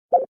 UI Close

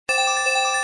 Number Running; hockey

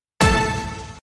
scatter stop

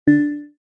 Normal Max Bet; hockey

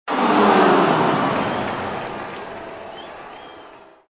Smathing Cheer; hockey

